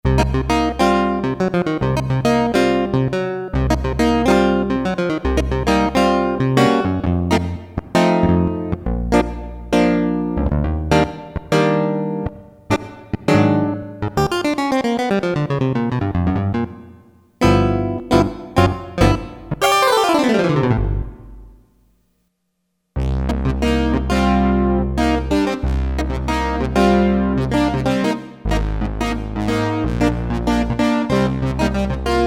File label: CLAV, Roland Jupiter-8; Factory patches